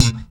POP A#, bass; Spectrasonics; Bass Legends CD 1

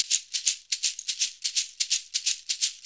SHAKER, Modern Percussion Loops; USB Soundscan vol.56; AKAI

